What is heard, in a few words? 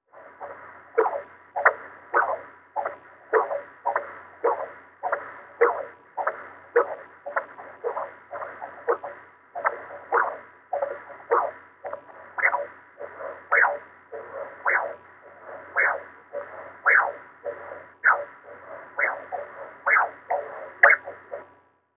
Sonogram; Interior Human Cavity Heart Pulses, Static Various; heartbeats